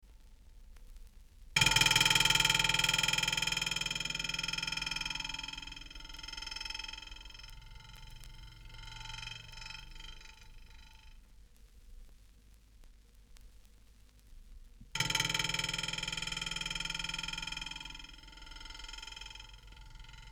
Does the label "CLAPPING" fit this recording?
No